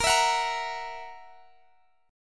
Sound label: E Suspended 2nd Flat 5th Bb